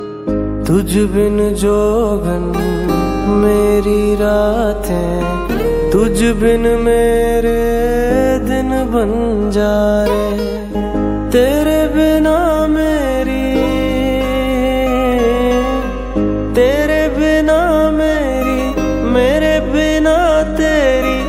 Old Hindi Song Ringtone

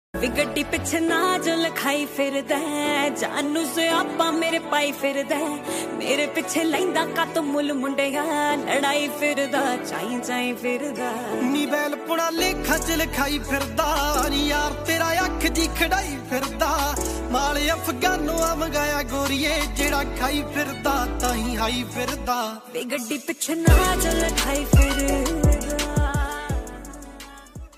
Punjabi Song

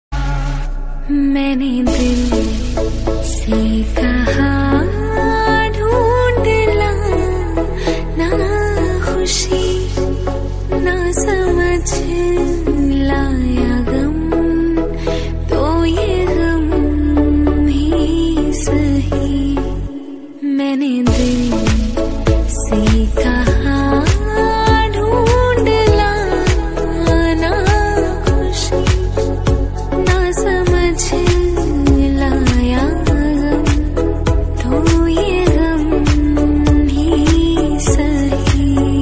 Female Song Ringtone

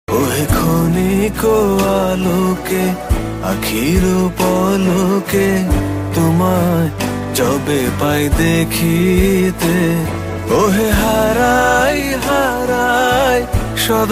Bengali Ringtone

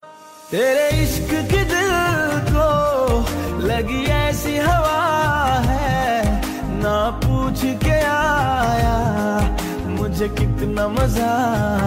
Love Songs Ringtone

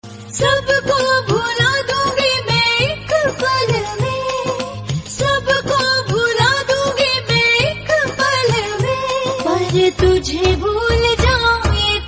Female Ringtone

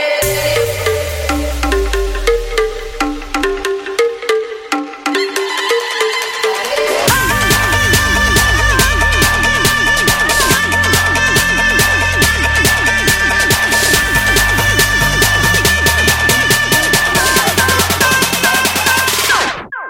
Ringtone For Mobile Phone

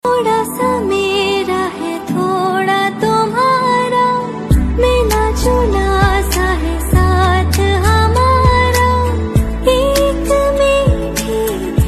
Hindi Song Ringtone

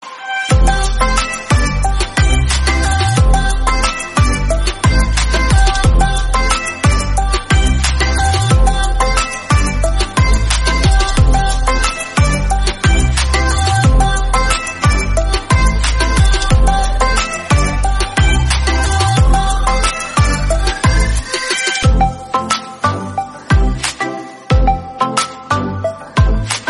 Instrumental Calling Ringtone; Oneplus 11

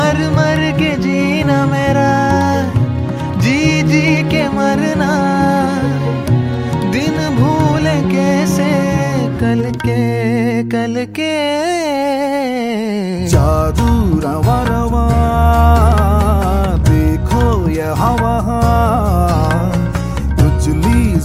Hindi Song; ringtones; Kabali

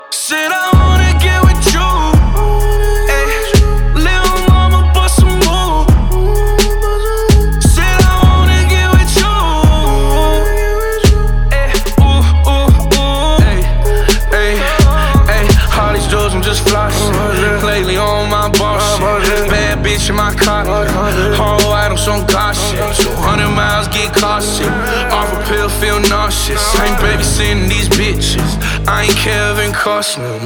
Hip Hop

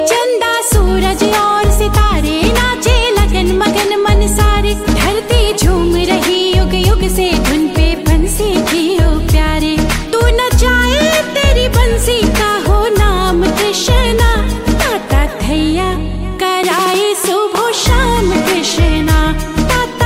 Hindi Bhajan Ringtone

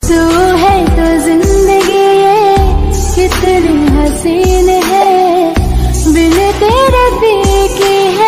Song Female Version Ringtone

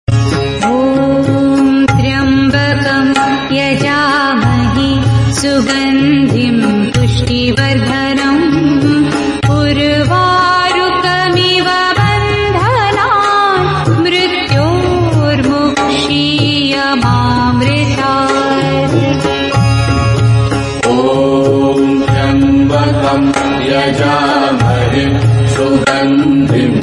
Om Tryambakam Yajamahe Ringtone; ringtones

